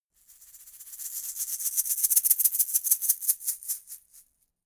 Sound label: shaker plastic egg rattle dynamic movement